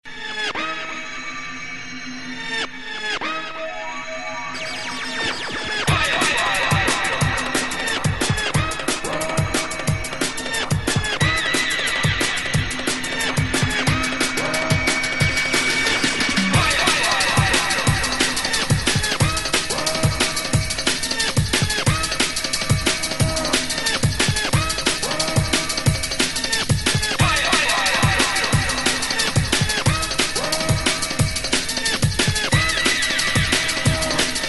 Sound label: jingle, Party Vibe Radio jingle